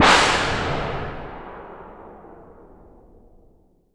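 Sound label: AIRBORNE, percussion metallic; Rarefaction; Poke in The Ear With A Sharp Stick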